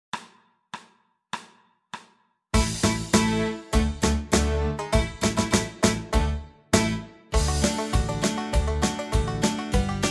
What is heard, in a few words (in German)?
Polka nur Begleitung